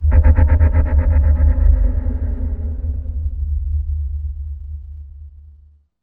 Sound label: scan; orbit